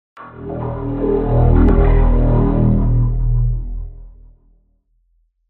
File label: in TV Vocoder